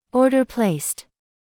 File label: order placed; QuantumTerminal